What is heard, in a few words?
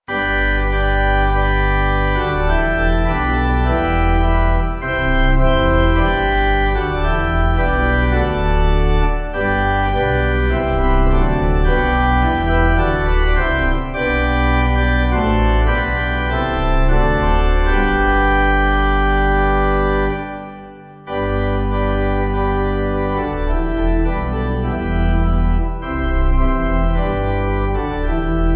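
Pipe Organ; Father Hear The Prayer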